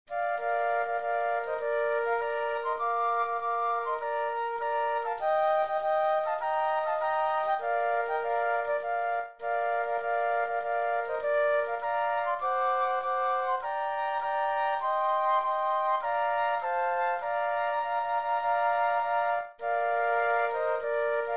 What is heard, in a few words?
Flute Trio; patriotic